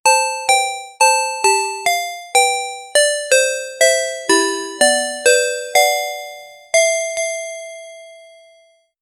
cheerful success chime; achievement

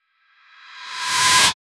dark trap riser gritty

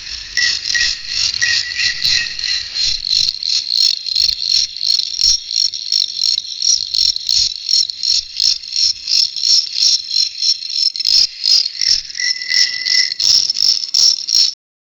a jingle that starts with, desert

